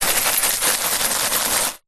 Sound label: glass broken pieces shake in dustpan brush; foley; zapsplat